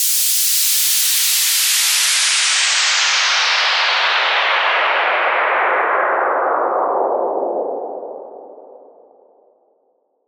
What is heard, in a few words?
downlifter one shot clean 128; SPINNIN